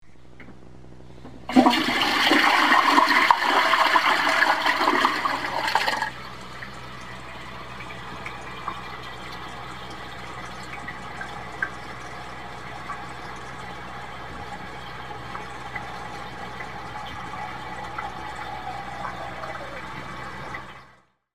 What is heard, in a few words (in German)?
Toilettenspulung